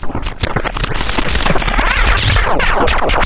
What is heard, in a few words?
pain, gonarch; svencoop; cracklife